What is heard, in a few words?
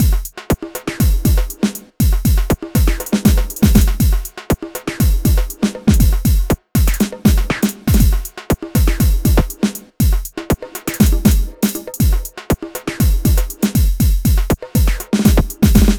Beat, Drums, Eclectic Electronica